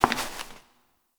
Step Perforated Metal; Warframe; Koumei

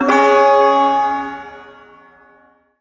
Shawzin Chromatic Chord; Chromatic Chord B; Warframe; Duviri Ersatz Shawzin; Chords

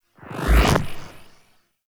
Pull; Warframe; Mag

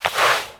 Crouch Move Foley; Warframe; Characters; Tenno

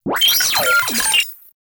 Sniper Drone Vocal; Lasrian Drones

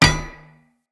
Unarmed Punch Impact Metal Shield; Weapon Impacts; Player; Combat

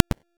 Equip Impact; Dante; Noctua; Warframe

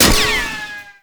Shield Impact; Enemies; Gameplay; Lasrian Tank; Warframe

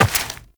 Step Rock; Footsteps; Walk; Warframe; Tenno

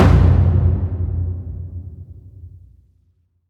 Taiko Drum; Warframe; Dojo Props; Taiko Drums

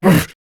Pain; Warframe; Captain Vor; Dialog Barks Battle

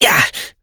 Pain Short Operator Voice; New War

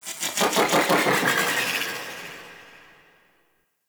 Sentient Lair Exterior Creature; Warframe; Ambience; Misc Creatures

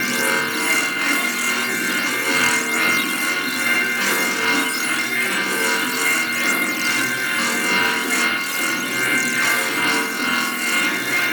Arsenal Machine Loop; Warframe; The Teacher; Mod Quest

